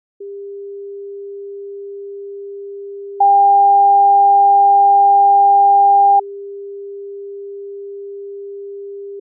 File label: masking puretone masker 800 maskee 400